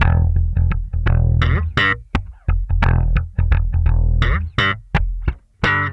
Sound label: BASS, Funky Ass Loops; East West